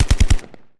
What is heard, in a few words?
LIGHT MACHINE GUN BURST; bursts